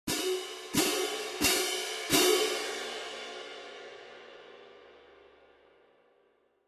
20in Symphonic French Tone Pair, cymbal

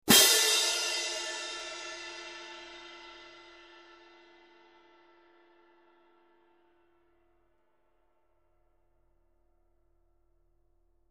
18in Stadium Medium Pair, cymbal sound

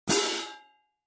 K Con Med Heavy, cymbal

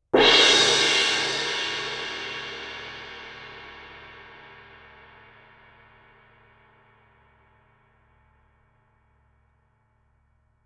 symphonic suspended Small Mallet Hit, cymbal; K Klassisch Symphonic; Mallet Sticks; オーケストラ用シンバル; K Klassisch Symphonic※は、繊細な音楽表現を大切にするアーティストがコンサート大ホールで演奏することを考えて開発された新しいオーケストラ用シンバルです

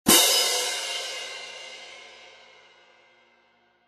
18in Z MAC Pair, cymbal sound

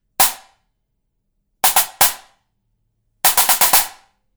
Stacker HiHat, cymbal sound

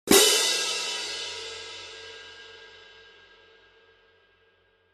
K Con Med Light, cymbal

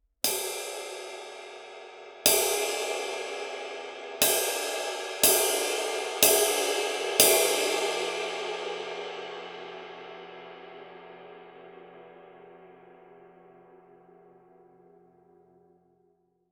22 Medium Low Bow, 22" Kerope Medium Low Ride; ride cymbal

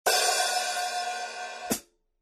13in Custom HiHats, cymbal sound

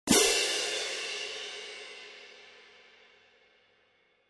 20in Concert Stage Pair, cymbal sound

